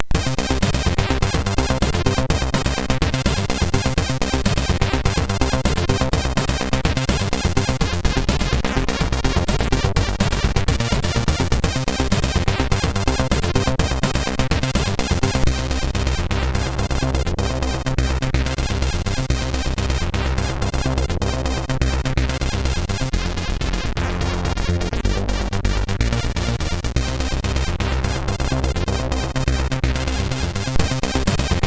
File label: Last Heartbeat, sid MOS6581R3